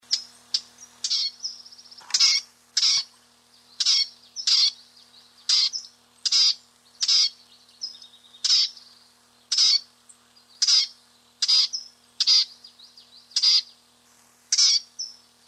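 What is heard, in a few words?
Sylvia curruca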